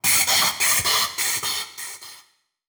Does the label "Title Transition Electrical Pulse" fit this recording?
Yes